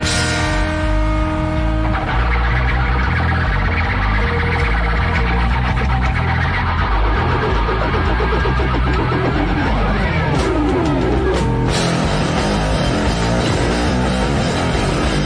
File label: Riff